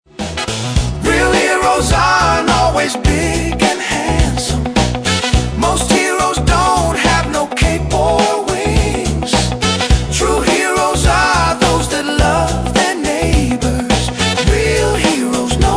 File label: Soul Swing